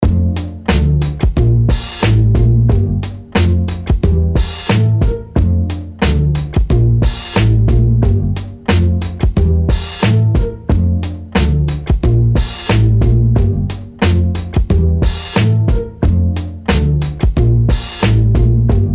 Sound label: beat